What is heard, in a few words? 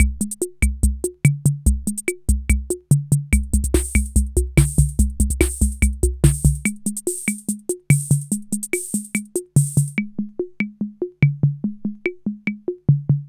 R77 Rhumba & Foxtrot; rhythm77